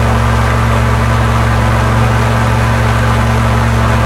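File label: bomber cockpit right, engines; War Thunder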